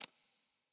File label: spin Button Release; strollingstaxx